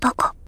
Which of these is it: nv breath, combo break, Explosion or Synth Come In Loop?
combo break